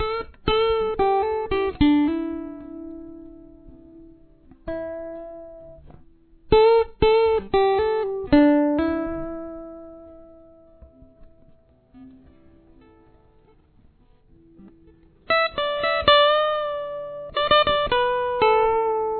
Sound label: chorus solo, Guitar 2 ( lead )